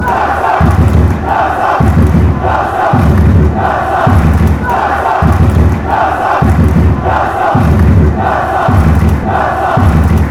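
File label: cassa, football chants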